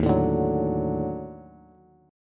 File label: Eb Minor Major 7th Flat 5th, down, Bass; strummed; chord; JGuitar